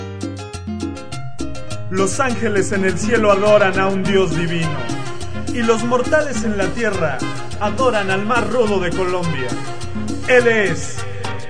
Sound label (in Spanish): Colombiano